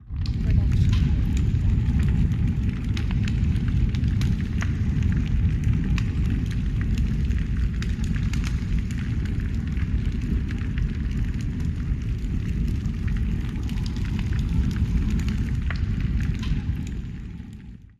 Large Fire cavernous; SoundsCrate

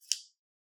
scissors

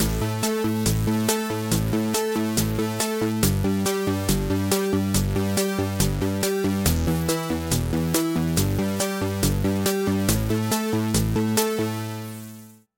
game logo; soundscrate

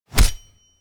Knife Stabbing; soundscrate

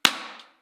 Hollow Metal Thud

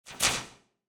spell whoosh; soundscrate

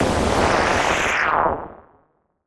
Anime Super Blast Off

